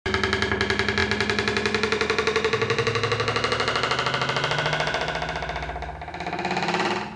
Diving board

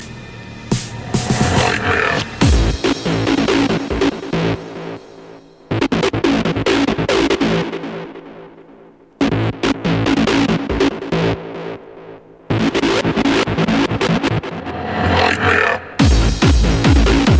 Acid Techno

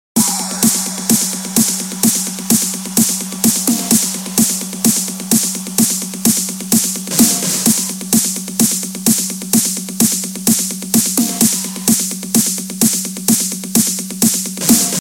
Trance

